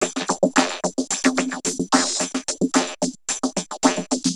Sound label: Vocobeat, Industrial Beats; Sonic Foundry (Sony Creative Software)